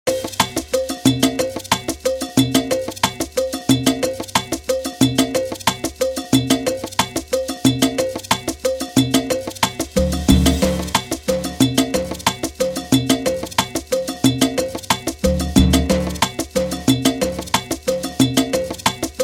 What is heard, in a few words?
SALSA INTRO BPM 90